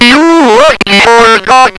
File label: HOR DOG